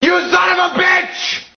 sob

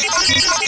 hack

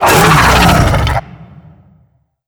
attack; gonarch

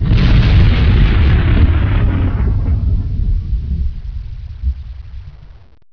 Thunder roll

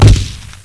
big gun

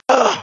MINOR PAIN; cash

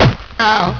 melee hit

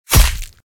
stab; ragemap2024; grunt

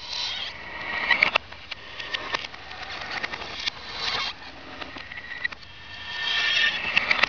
metal

